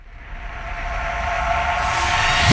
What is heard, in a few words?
Electro FX; Ueb HE Electro FX